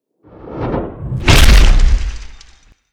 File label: golem stomp